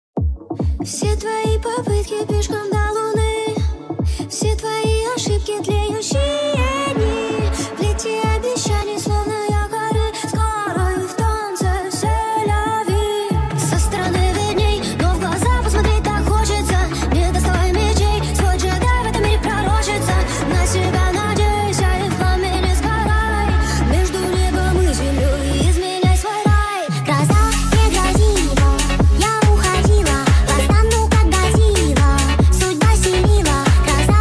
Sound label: speed up